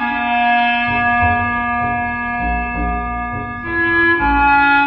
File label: sax, sad; loops